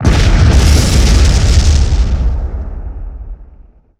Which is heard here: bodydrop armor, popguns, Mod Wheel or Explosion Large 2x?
Explosion Large 2x